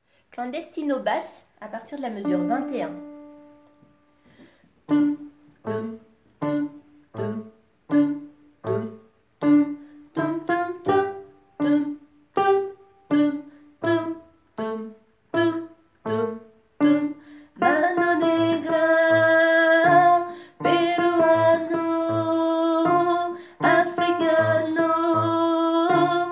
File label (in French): basse